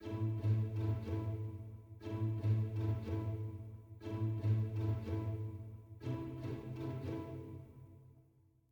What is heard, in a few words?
heroic soft start; gamerip